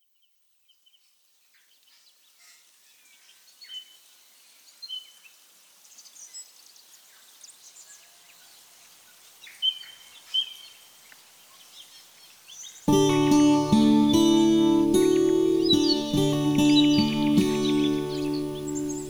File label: music ambiance karaoke full mix